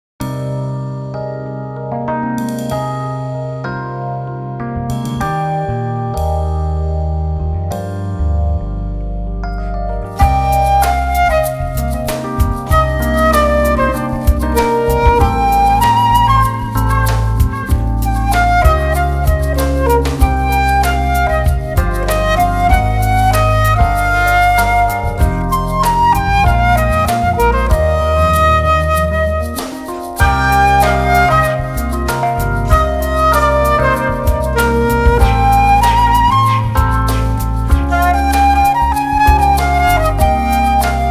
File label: African Moon Flute with jazz trio